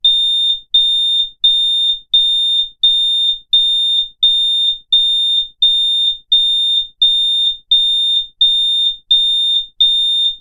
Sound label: House Fire Alarm